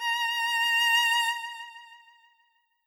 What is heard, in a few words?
violin